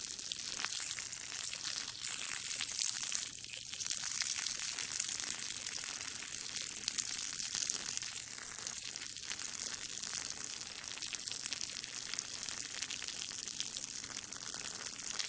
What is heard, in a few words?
pee; cstrike